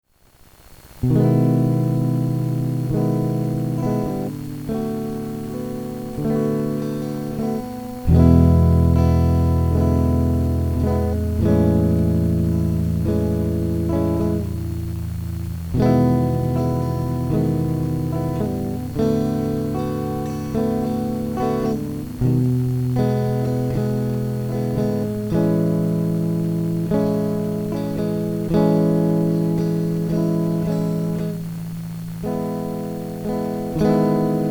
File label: instrumental